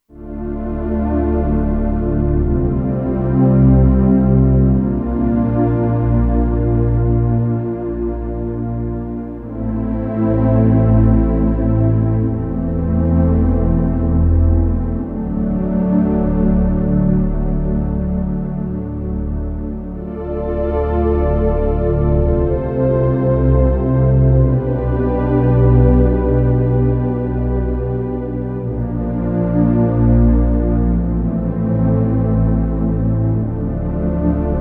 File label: Padifier, Kurzweil K2500X; Factory Programs